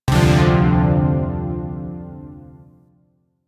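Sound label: Shawzin Major Chord; Zariman Shawzin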